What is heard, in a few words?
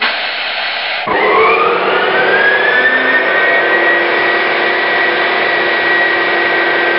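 Compressor Start, Train; OpenBVE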